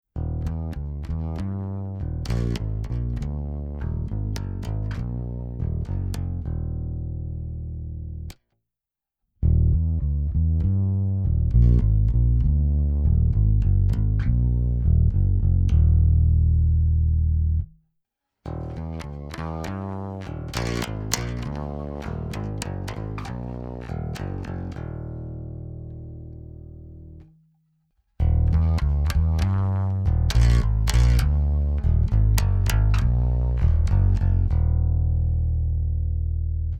Bass Godin Fretless dry Bass Full Bright High Full